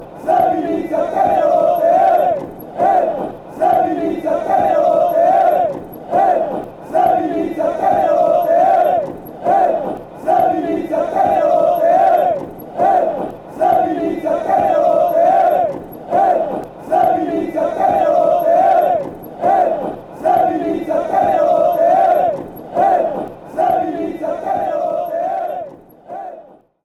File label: hey hey fan chants